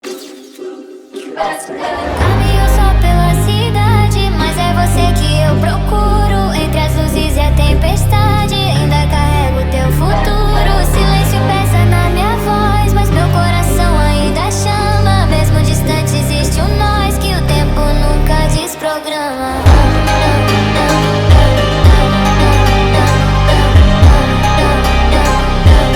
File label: phonk